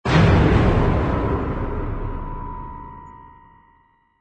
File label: Epic Movie Trailer Thunder Punch Hit; 史诗电影预告背景音乐